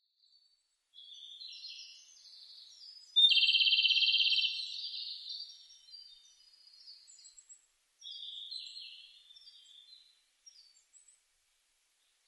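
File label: Japanese robin; komadori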